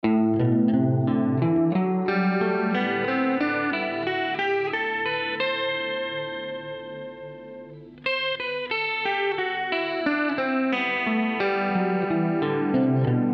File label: Harp Dorian Shape; open string awareness; ragajunglism